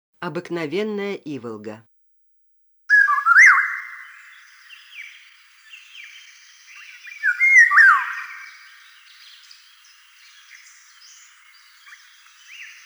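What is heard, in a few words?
Eurasian golden oriole voice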